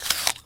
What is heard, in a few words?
eat apples